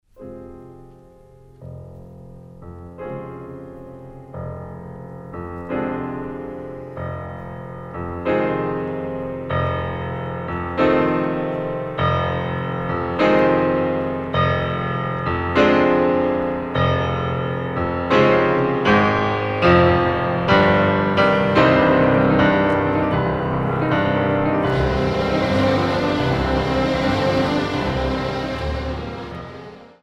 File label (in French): CONCERTO POUR PIANO ET ORCHESTRE EN UT MINEUR Allegro moderato; RACHMANINOV; Concerto pour piano et orchestre n° 2 en ut mineur op. 18